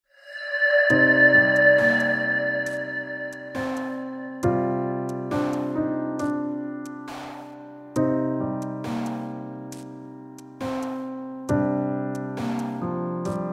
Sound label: AMBIANCE